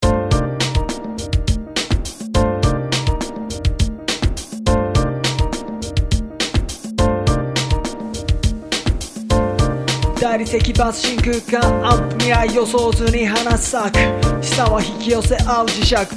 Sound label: slow